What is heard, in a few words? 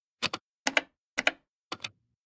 Input Room Numbers; LOOPERS